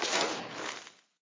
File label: creeper death, mob; Eaglercraft; eaglercraft-b1.3